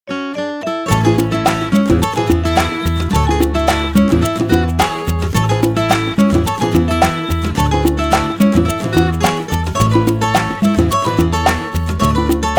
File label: Beach Party, ringtones; Tecno Pop 5